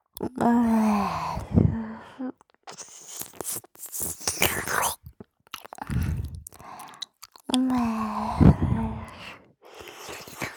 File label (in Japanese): れぇろ…れるるる…, 右耳